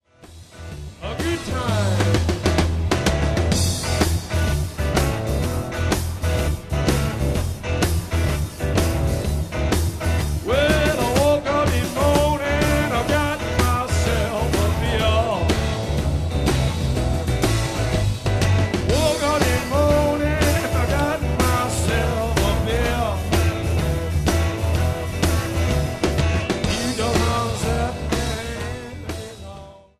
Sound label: Blues Shuffle